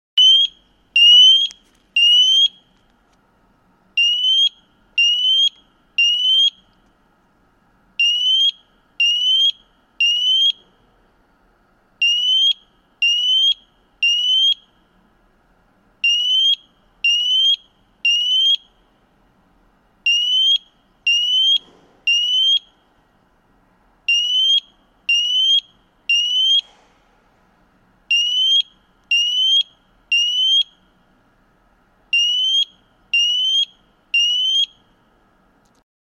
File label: Alarm-tone, rauchmelder; Ningbo Siterwell Electronics Co. Ltd; GS526